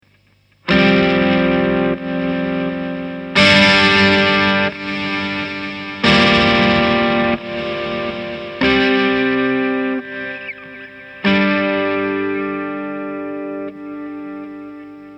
ducker delay hall

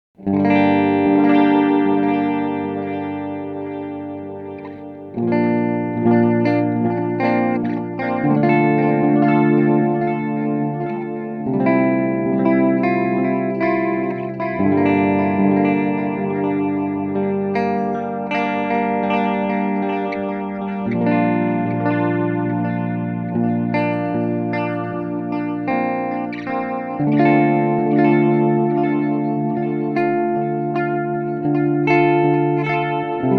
Free the Tone Future Factory Modulation Vibrato

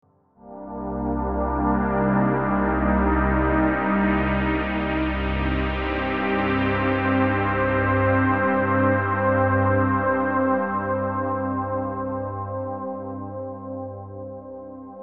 Filter Strings

